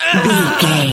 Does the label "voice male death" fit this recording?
Yes